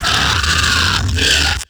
guard idle

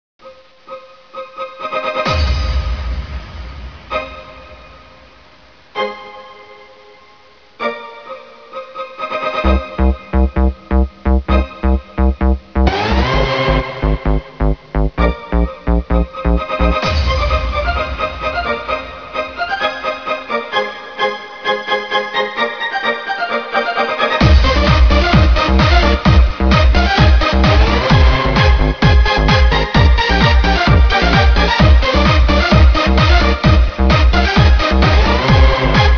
song

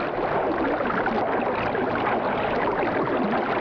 water waves

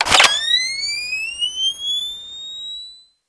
nvg on

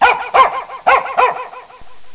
dog

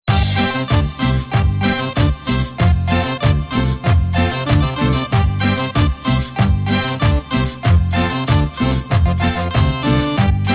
maraca

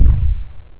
footstep; hulk

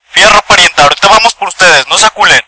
radio

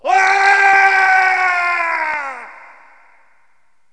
falling

